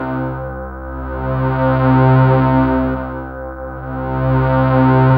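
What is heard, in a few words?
synth Morpheus; Sound & Vision - Gigapack II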